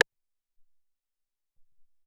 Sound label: Tek Rim, Alesis SR-18